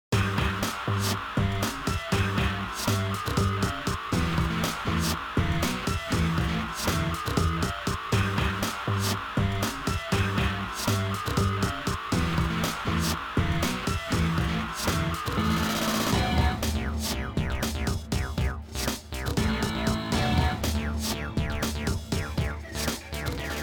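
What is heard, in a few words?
Metal, 120 bpm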